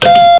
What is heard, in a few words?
lock